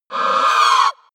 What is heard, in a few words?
wraith die; schreie